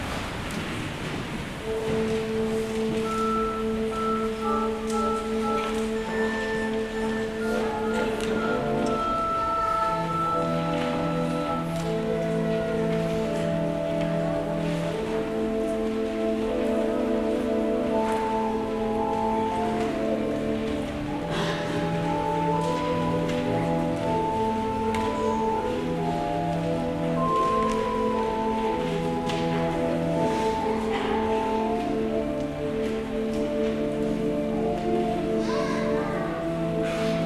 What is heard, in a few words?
Choral